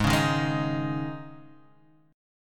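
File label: G sharp Suspended 4th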